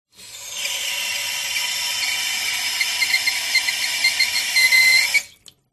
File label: household tap faucet on short hiss pressure loud